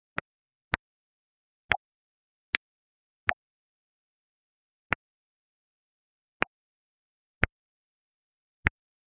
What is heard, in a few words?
soft asmr soun, adhesive